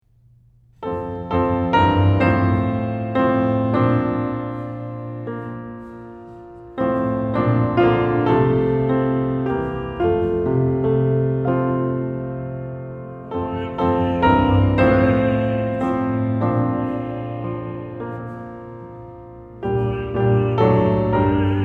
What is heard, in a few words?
Piano; While We Are Waiting Come; piano accompaniment pieces